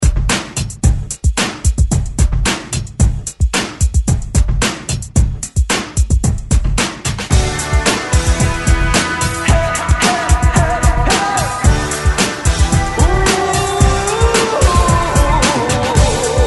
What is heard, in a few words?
80s Re Drum 111 Bpm; DJDOLLS